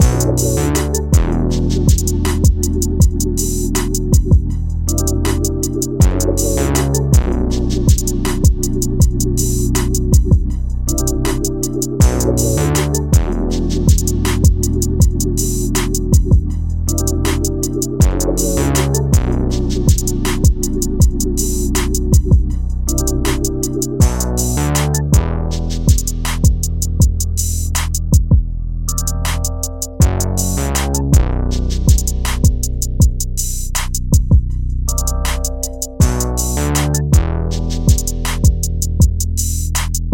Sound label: Intro R B Instrumental Loop